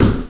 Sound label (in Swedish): golvsmack